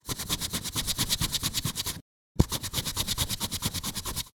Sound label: office pencil rubber erasing; zapsplat